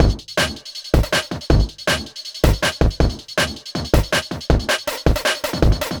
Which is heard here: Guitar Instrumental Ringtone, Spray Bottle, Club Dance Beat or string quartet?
Club Dance Beat